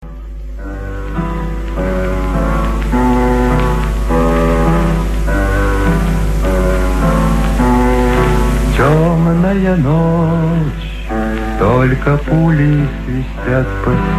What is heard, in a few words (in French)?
Chanson russe